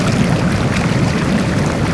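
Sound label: goo pour loop; movers objects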